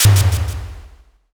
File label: pistol